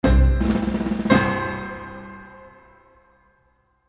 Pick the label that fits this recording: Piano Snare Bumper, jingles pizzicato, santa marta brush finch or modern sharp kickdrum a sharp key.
Piano Snare Bumper